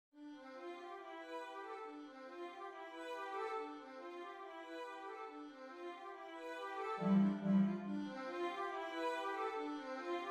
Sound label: violin & cello